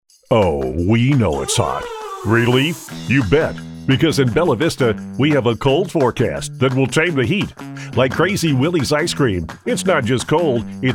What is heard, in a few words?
Chill